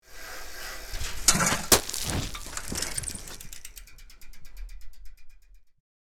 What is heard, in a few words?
Bicycle fall falling down losing control